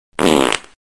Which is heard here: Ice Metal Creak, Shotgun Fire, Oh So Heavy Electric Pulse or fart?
fart